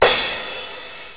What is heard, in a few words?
cymbal